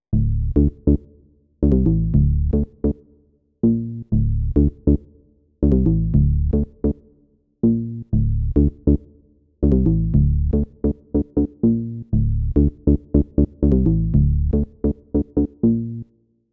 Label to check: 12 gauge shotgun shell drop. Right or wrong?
Wrong